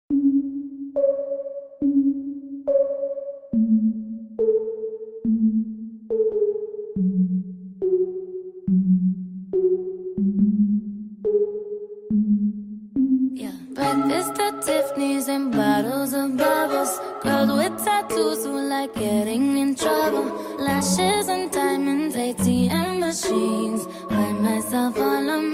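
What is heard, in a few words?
trap mix; LS senior street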